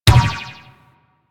Ball Bounce Default; Warframe; Missions; Tenno Ball

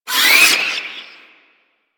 Hand Bomber Proj Warning; Warframe; Man In The Wall

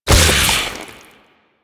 Modular Secondary Barrel Loop End; Warframe; SU Modular Guns

